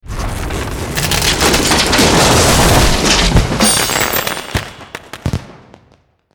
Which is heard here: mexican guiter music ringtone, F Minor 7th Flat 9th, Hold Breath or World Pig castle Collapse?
World Pig castle Collapse